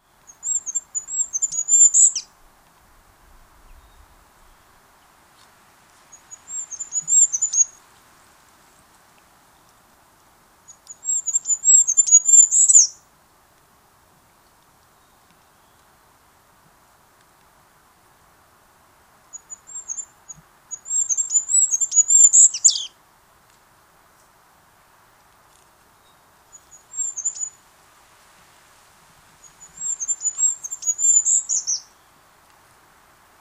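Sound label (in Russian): penie zheltogolovogo korolka, goldcrest song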